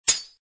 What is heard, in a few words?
get goods; croods